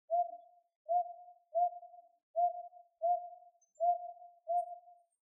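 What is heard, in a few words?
northern boobook call; aobazuku nakigoe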